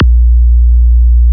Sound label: Korg Poly-6